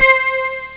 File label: Organ